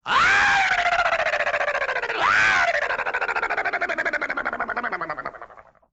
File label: "ah bbb" cartoon